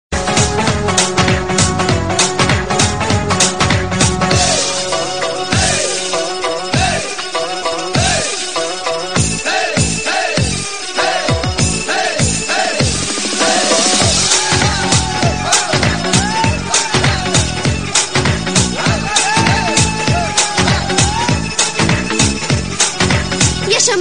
turkish beats

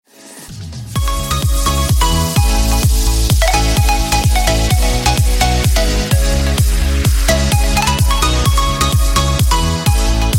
ringtone

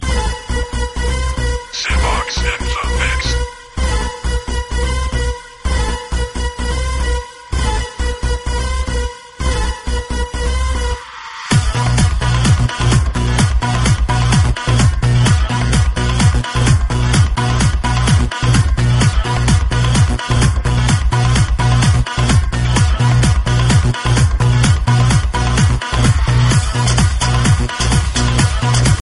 club arabic

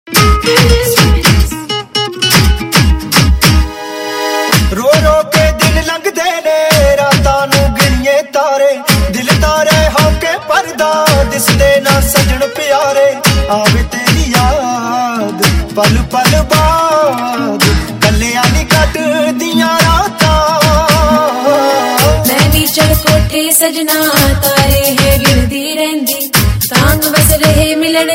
punjabi ringtone

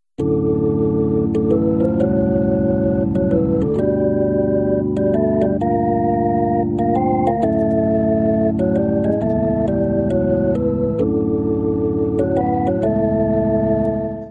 hammond organs, ringtone

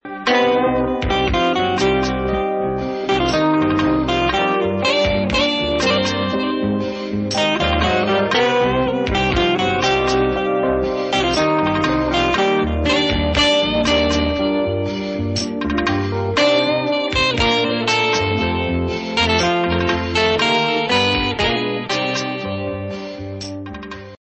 telugu ringtone

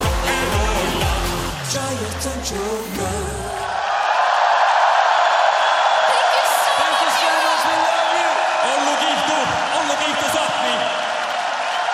norway sms ringtone

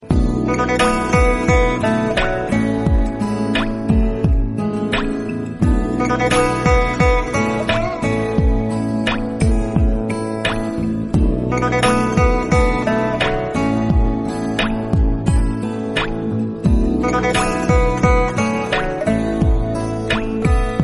bamboo dance

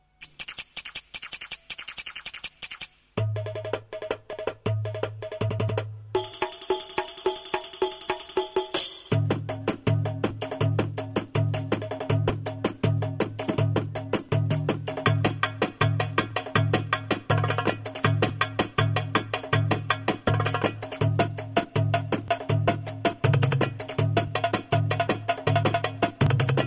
arabic drum

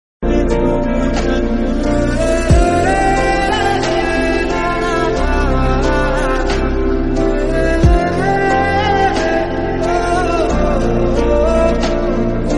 bollywood music ringtone

